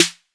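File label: Roland 808 snare drum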